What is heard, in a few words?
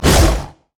pounce; nidalee base